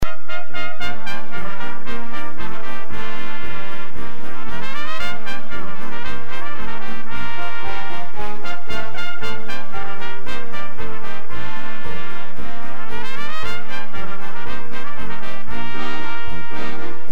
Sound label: brass